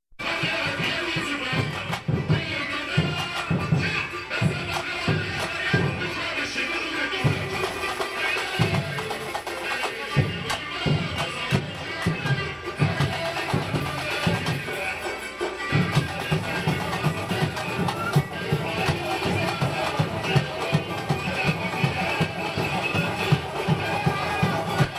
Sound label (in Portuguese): Break sortie tamborim Ao Vivo; Hey enredo; Salgueiro; Rio